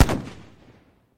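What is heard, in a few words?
quiet distant short pop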